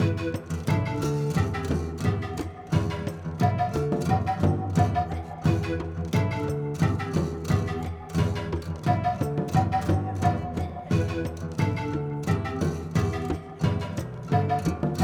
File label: drumulus singalongus G